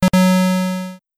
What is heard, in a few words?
Startup; Windows Never Released Soundpack 1; deviantart